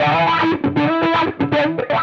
Electric Guitar, Loops; Guitars

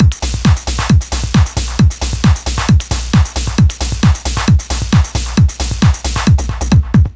Dance Drumloop; Drums; Dance Beats

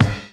Kick One Shot, Drums

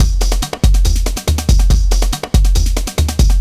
Dance Drumloop; Drums; Dance Beats

